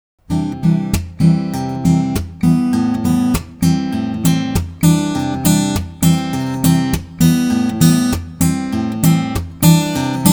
Changes Offbeat, acustic

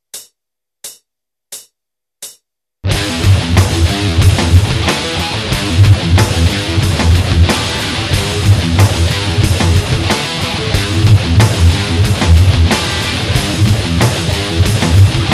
scratch, guitar

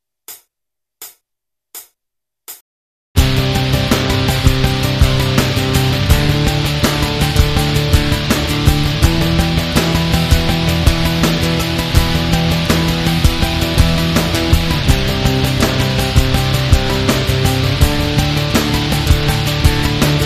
blurry chorus

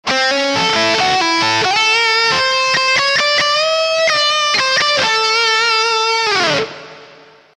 lick slomo

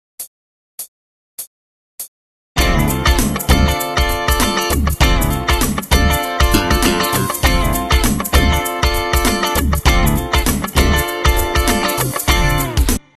funk, guitar

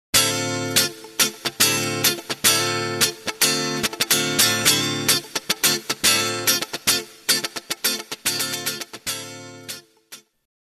funky scratching, guitar

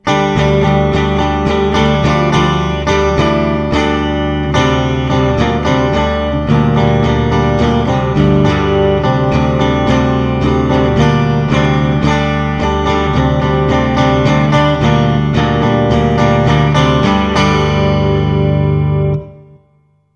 the tune up slomo

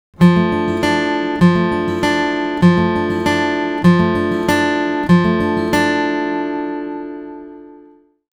Slow Roll, acustic

